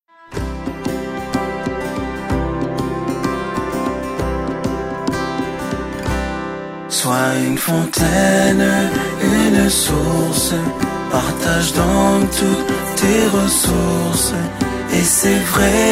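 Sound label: Song, French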